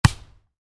Volleyball hit hard, Volleyball (Exterior): Hit Hard; Sports; Miscellaneous Sports